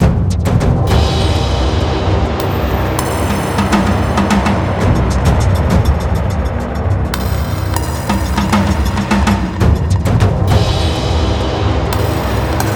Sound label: Musik Coral Exploring